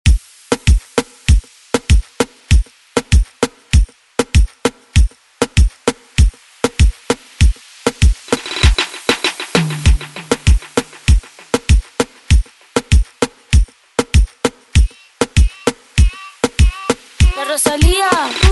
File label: Re Drum; DJ Mhark